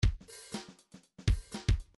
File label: midi grooves funk